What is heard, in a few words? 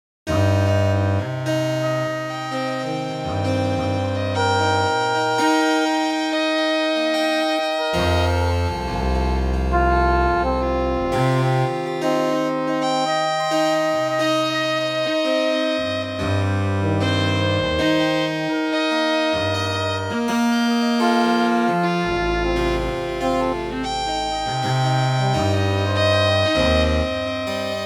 In Just Intonation Dorian Mode, fractal music Chord Progressions